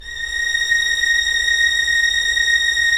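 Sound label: Strings Violins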